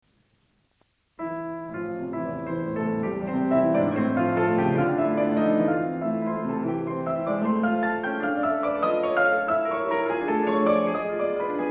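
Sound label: Variation II - Poco animato, piano; MICHELANGELI; BRAHMS; Variations on a theme by Paganini, Op. 35; Book 2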